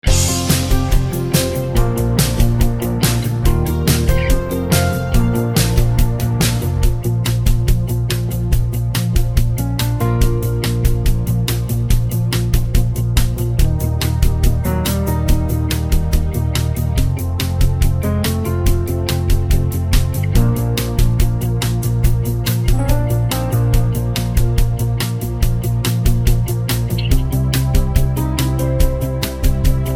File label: (Bb To B) No Backing Vocals